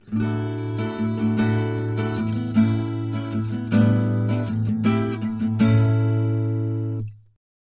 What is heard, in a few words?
Acoustic Guitar Happy